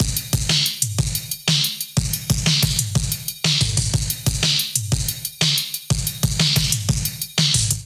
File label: Acoustic Australia Kit 122 bpm, Drums; MIDI Clips; Drive and Glow; Ableton; Factory Packs